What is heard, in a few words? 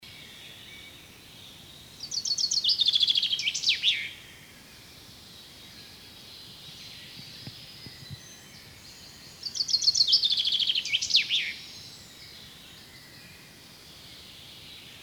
Chaffinch